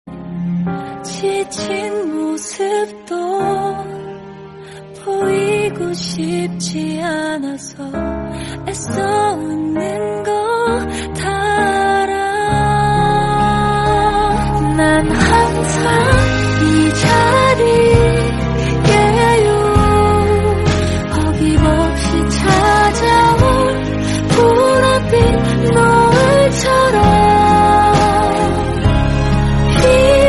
korean

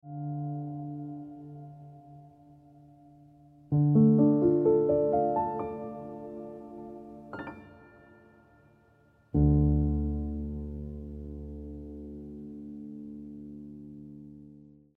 Ringtone Piano Music

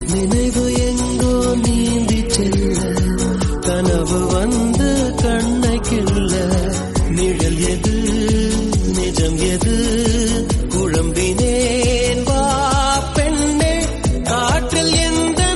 Tamil Song Ringtone; Kaakha Kaakha

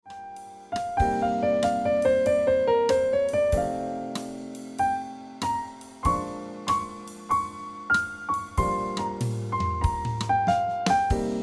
Ringtone Piano Music

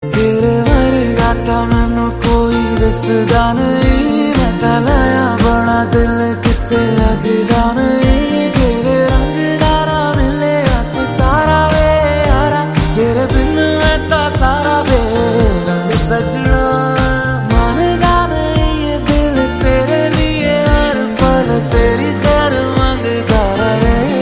Hindi

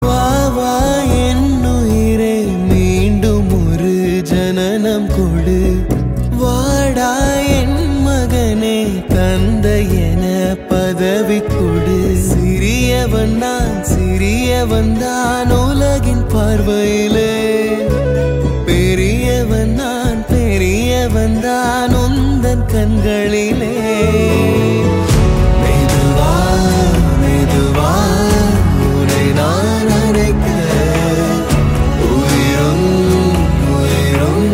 Tamil Song Ringtone; Dada

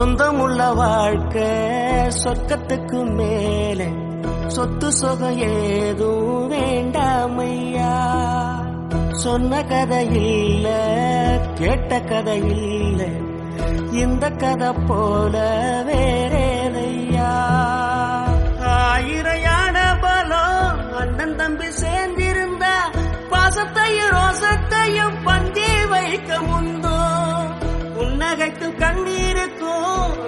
Tamil Ringtone; Anandham Vilayadum Veedu

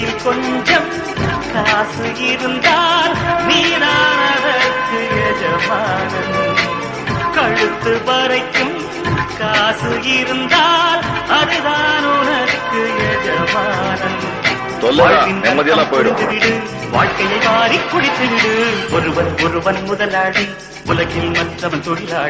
Song

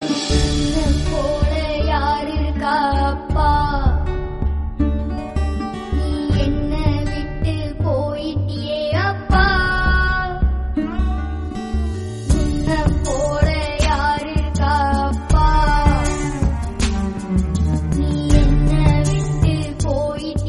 Tamil Sad Song

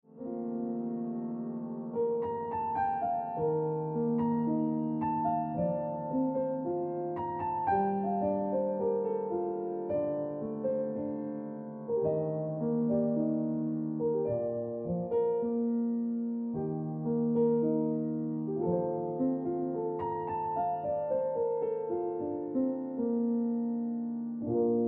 Ringtone Piano Music

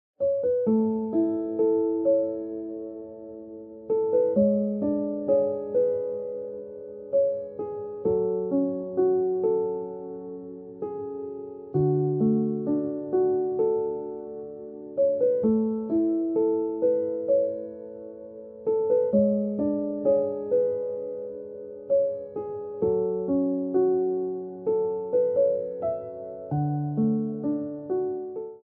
Ringtone Piano Music

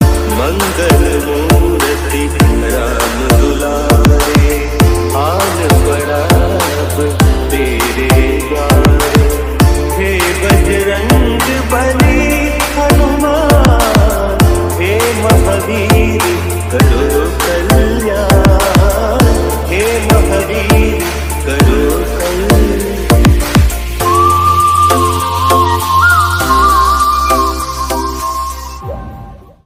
Dj Remix Soft Bass Ringtone

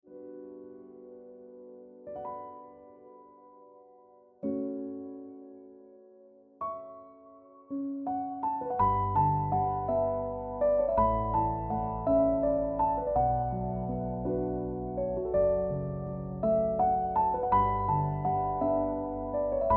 Piano Music

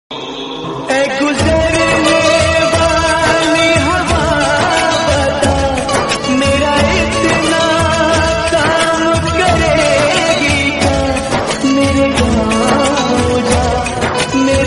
Desh Bhakti Hindi

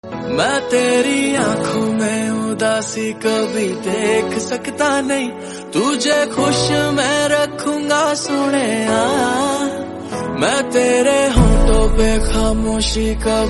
Hindi Song

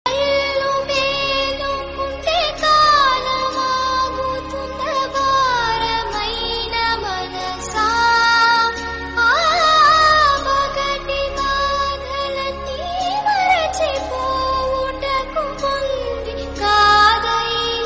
Female Version Tamil

